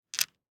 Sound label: DICE on Felt, Grab, Standard, Four Dice; Samples; ArticulatedSounds; DICE Sfx Party (Extended)